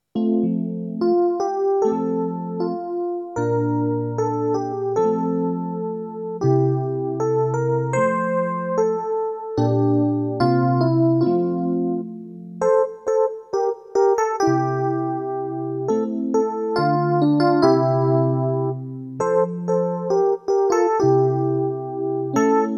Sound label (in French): orgue trompette; Chants Liturgie; Chants Paroisse; Spiritualité